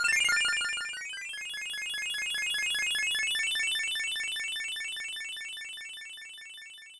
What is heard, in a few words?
warp star; kirby